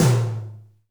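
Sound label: tom drum, right; Best Service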